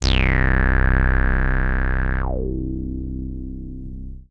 BASS

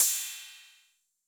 Cym A 808 Sat Decay A, Cymbal, Multi Saturated

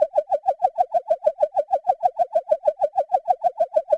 Chirp FX DX100 Gs1; birds

